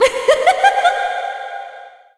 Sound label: Great Fairy Laugh Exit; Great Fairy laughing